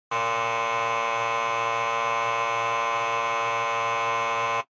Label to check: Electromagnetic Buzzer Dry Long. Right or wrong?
Right